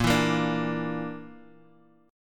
Bb Major Flat 5th Bb down Guitar Half Step Down, chord